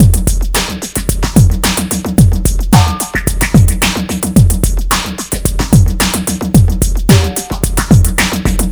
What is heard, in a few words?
NRG Break, Trance, Drums, Breaks